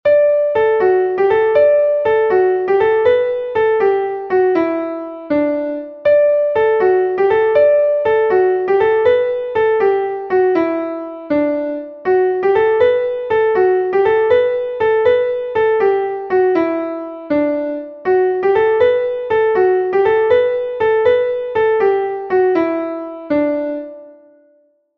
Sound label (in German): klavier; MelodieMeister